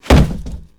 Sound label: drop; scuttlebutt